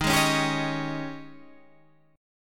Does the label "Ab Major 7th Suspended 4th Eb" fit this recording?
Yes